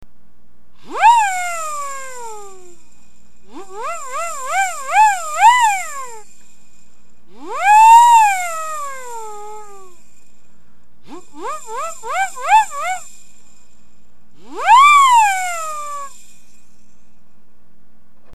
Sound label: siren